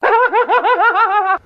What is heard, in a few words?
laugh